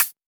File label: Closed HH